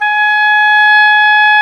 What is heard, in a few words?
oboe, Oboe Brth